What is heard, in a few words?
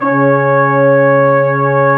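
brass quintet long; Roland L-CDX-03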